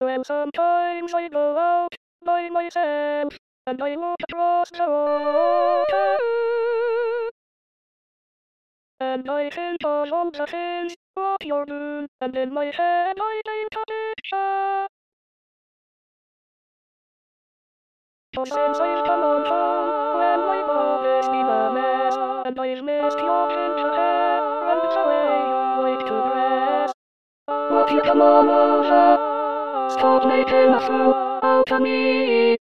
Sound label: lead SATB all